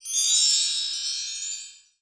Sound magic

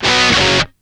interval; Computer Music Interactive 30; Samplenet

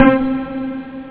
pizz

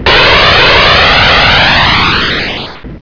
rocket; How Many Bugs in a Box

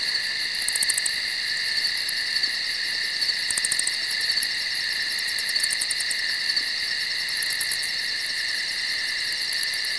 ins loop; DAoC

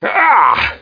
SW PAIN; sw demo

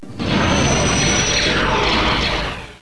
rocket; My Animated Word Book

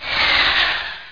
Screech; Solcity

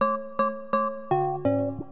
SYNTH HOUSE 125 A; eJay; Keys

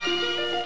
Close Program; CHRISTMASKISS

